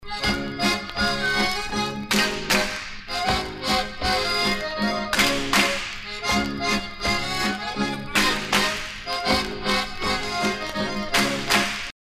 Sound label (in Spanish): tradicional real